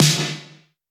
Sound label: Long Room Reverb Acoustic Snare D Key; snare drum samples